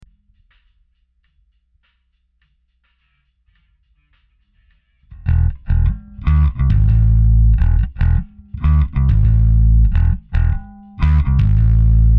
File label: bass